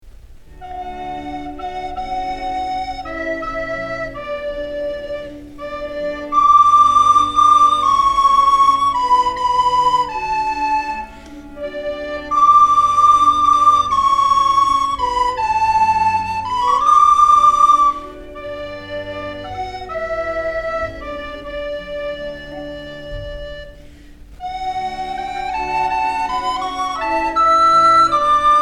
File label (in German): blockfloete; santo-santo